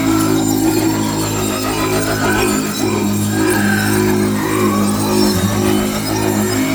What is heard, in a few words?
Thurible Protection Loop; Lyon; Warframe; Tau; Roathe